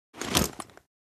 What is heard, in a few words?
Military equipment movement; military equipment sound effects